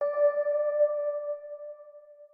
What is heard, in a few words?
organ